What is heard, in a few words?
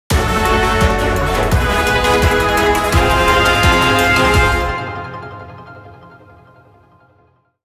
Unknown Event Jingle; Metroid Prime Blast Ball; 3DS